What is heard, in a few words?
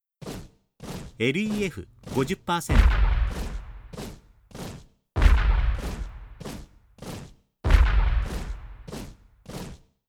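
taihou, cannon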